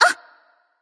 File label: surprised light; smite; voicepack; hel